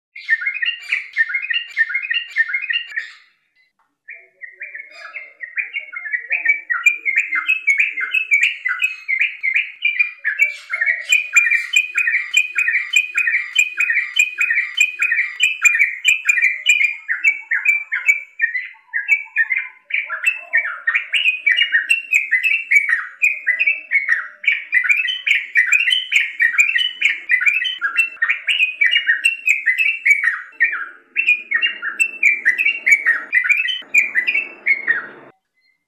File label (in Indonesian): Suara Kicau Burung Trucukan Ropel Gacor